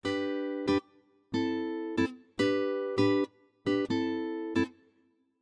bgm 93bpm GMaj; 8beats; chicory a colorful tale; ps4

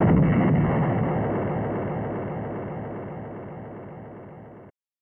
Nuclear Explosion; True Lies; SNES

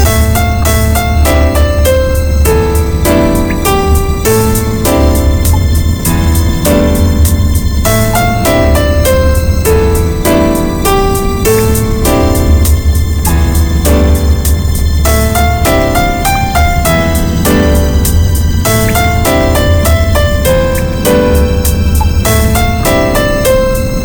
Piano

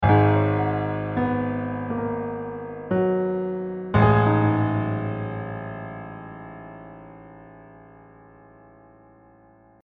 Level 2 Victory; chirpy reverie online gamerip 2021

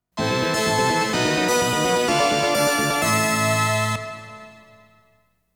Fight Again (Pattern Clear); Konami Game Music Collection Volume 1

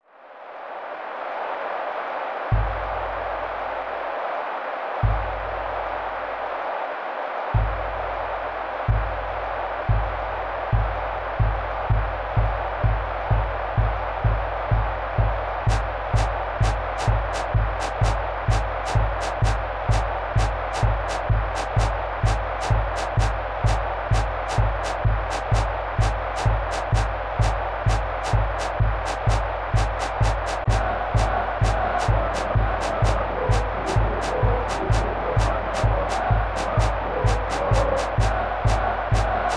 Soccer Game Ambience; J League Soccer Prime Goal 3; SNES

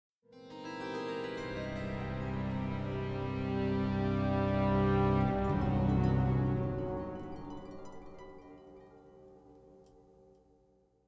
Stinger Mystery; Dishonored

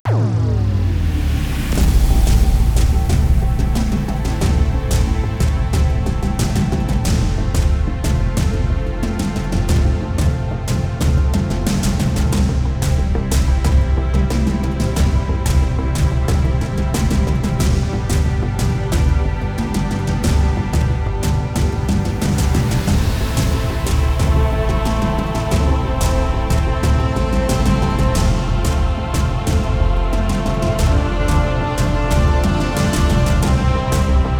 Battle Loop

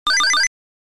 Harpoon; arcade; Dig Dug

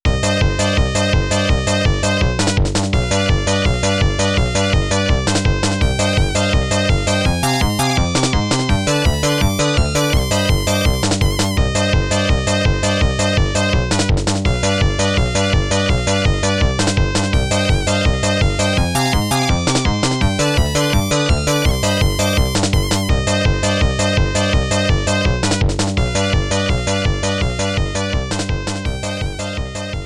Bonus Round Amiga; Street Fighter II; U.S. Gold collection

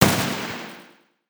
gun

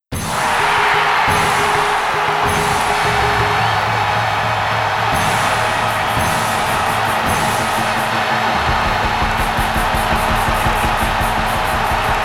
Spectator Chant; Heat of Eleven 98; arcade

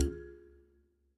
Loop Tail; Good Job

